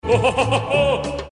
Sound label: ohohohoho; hl2dm; oppressive territory